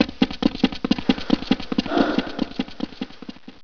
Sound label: run mix